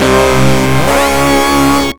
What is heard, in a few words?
Get Ready Jingle; ultracore genesis gamerip 2019